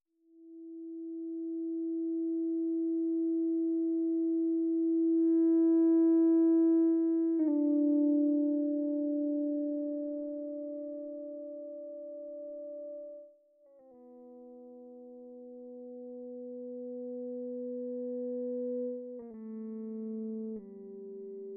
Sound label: Ebow Gtr dry